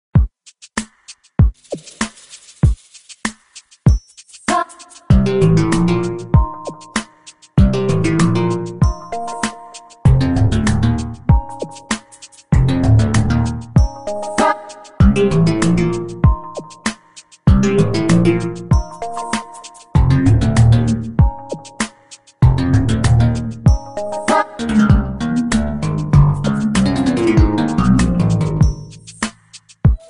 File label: Underground; NSMBW